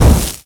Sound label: projectile impact; pum sahur; pop pop sahur